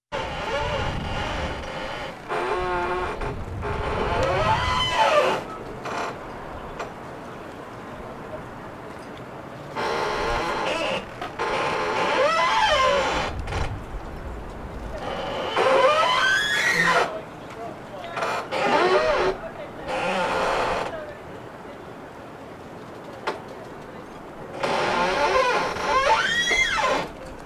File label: Docks and Boats in Marina